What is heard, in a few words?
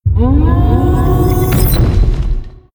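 giant wolf leader death, monster; Senillinea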